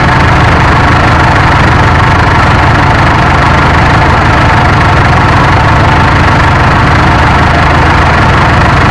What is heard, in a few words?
tank idle; mrk tank 03 idle